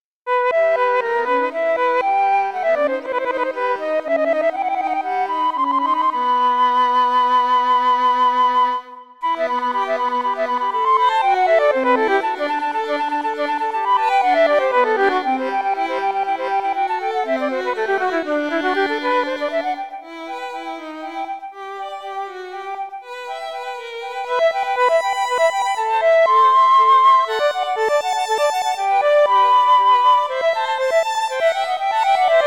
allegro for flute and violin

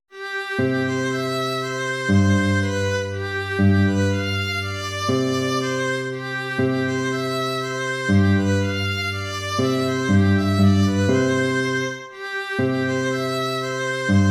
waltz; Carulli Ferdinando

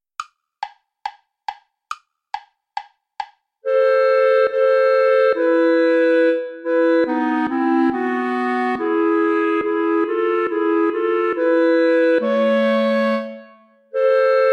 allemande play along without clarinet

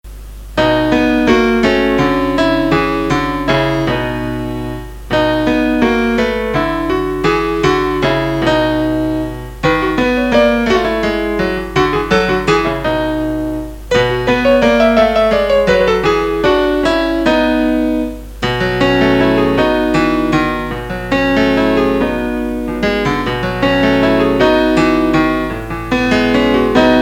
boogie song for piano

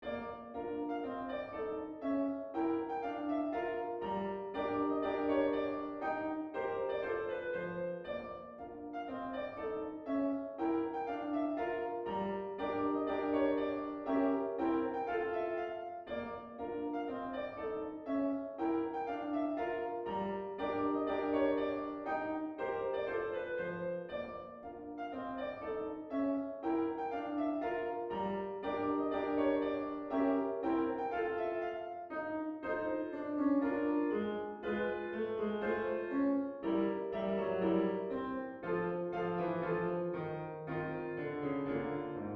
polka; tchaikovsky piotr ilitch

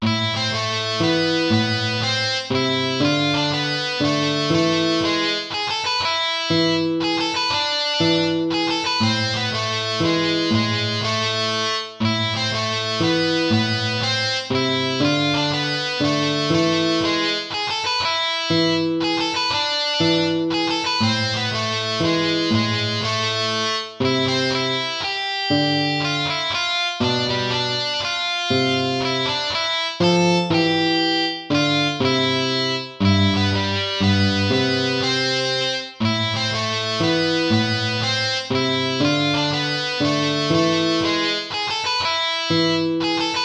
baroque minuet minor

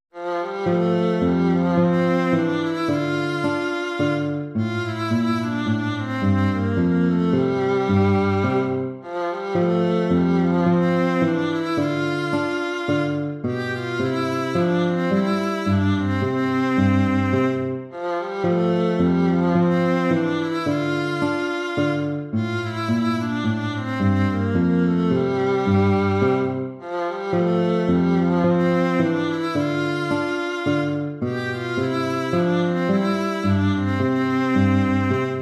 gavotte; haendel georg friedrich

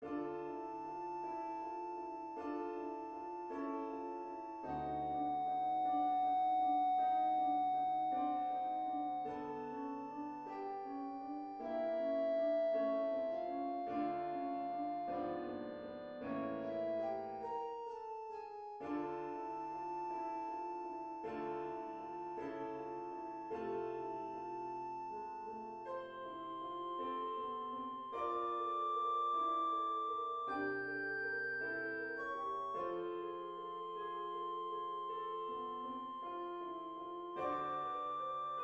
song without words; rachmaninoff sergei

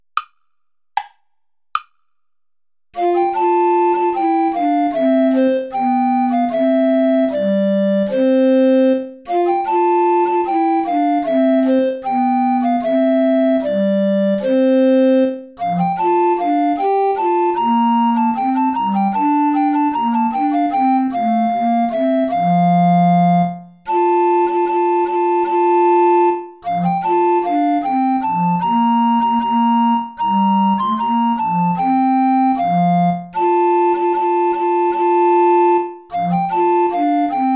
bransle play along without tenor recorder; praetorius michael gentil

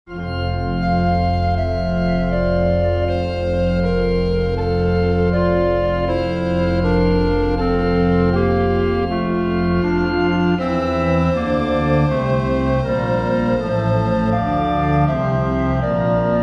prelude for pipe organ; bach johann sebastian; christe lamm gottes

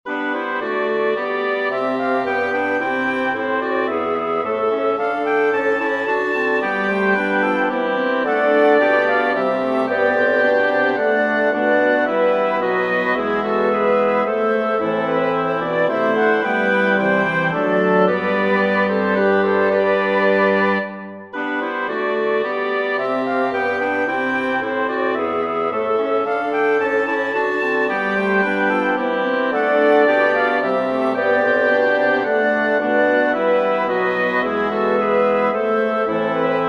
intrada for wind sextet

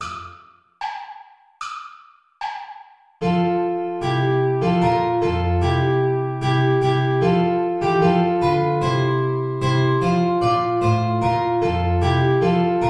play along without guitar

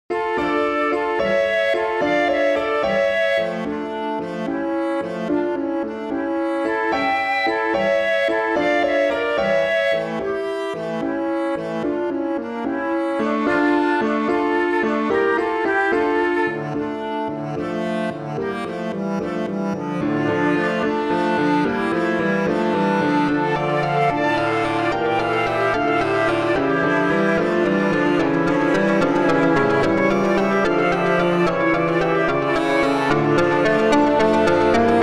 gentle waves

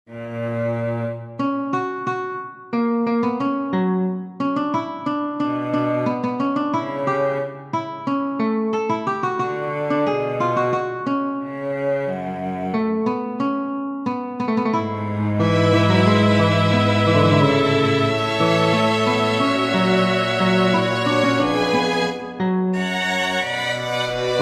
recitative for guitar strings; bach johann sebastian; und siehe einer aus denen die mit jesu

